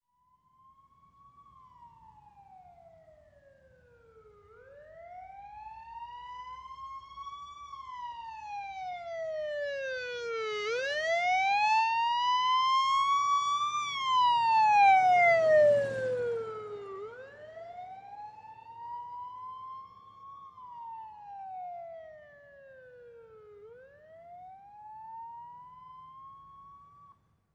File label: Police Wailer Siren In From Distant, By Close Left To Right Medium Speed, Away To Distant And Off At Tail.